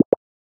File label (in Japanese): メッセージ